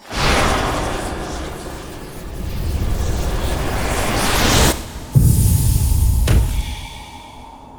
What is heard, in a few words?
powerup collect; hollow knight